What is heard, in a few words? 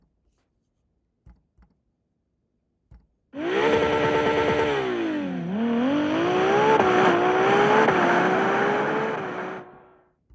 F80 Engine Rev, Ferrari